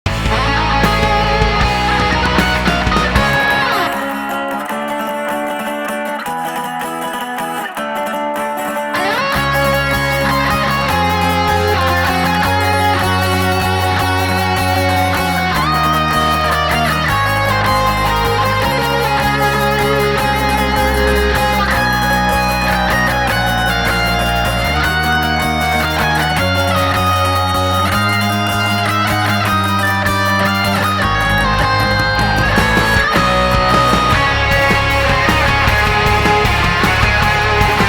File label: Rock Cover; Super Mario Galaxy